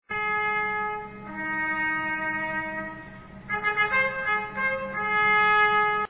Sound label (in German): zapfenstreich